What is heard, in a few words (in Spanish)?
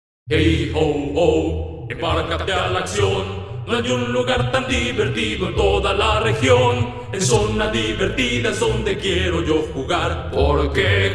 He ho ho solo cancion; Zona-divertida